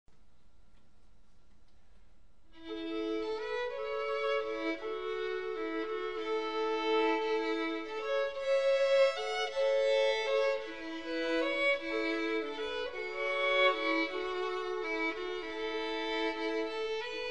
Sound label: Scottish Air